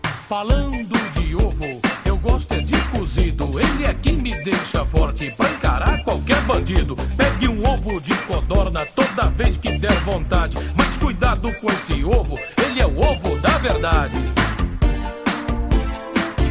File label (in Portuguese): rap do ovo; faustao